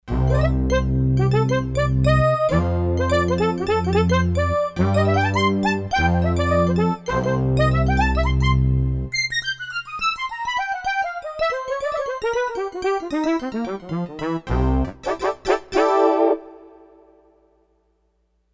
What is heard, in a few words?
Rich Wood, Roland D-10; Factory Patches